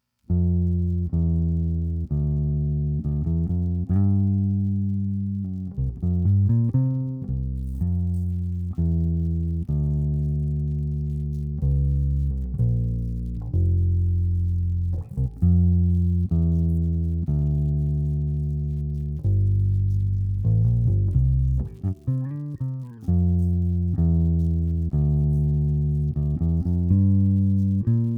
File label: bass